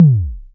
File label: sfx perc